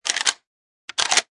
Shotgun Rack Designed, Rack/load (designed/processed; Gun Handling